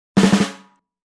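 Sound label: Drums Snare Short Crescendo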